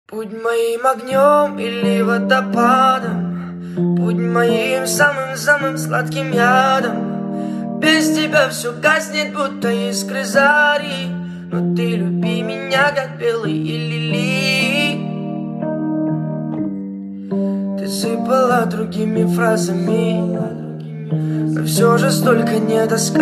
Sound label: voice cover